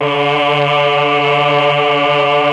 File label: CHOR, Propeller Island Legendary M400